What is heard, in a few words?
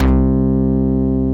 SMACK BASS